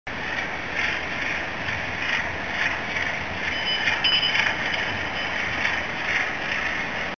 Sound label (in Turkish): DSH Gemisi